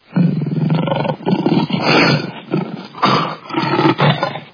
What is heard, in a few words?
search for victim hungry tiger; tigra